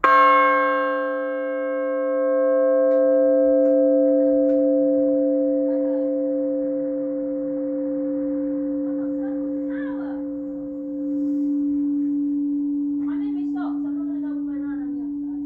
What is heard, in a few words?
bells of sussex; turners